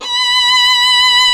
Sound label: SOLO STRINGS